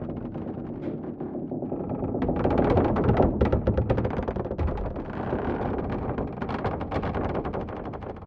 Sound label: Dock Creaking; silent hill homecoming 2008 gamerip pc ps3 x360